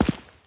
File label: Step Stone